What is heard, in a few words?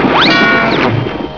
toon noise